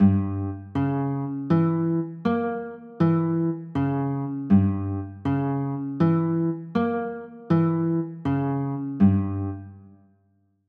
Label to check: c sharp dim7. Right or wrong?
Right